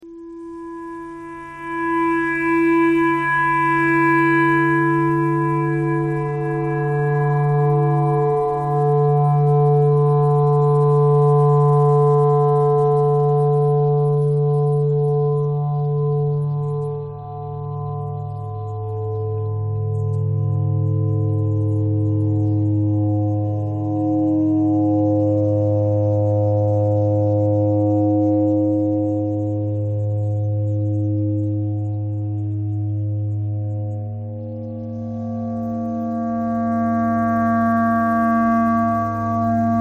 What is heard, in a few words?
clarinet clarinet thread noise